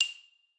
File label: xylo